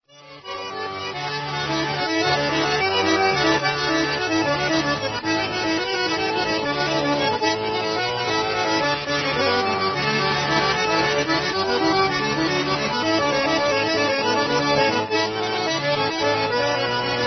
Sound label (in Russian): garmon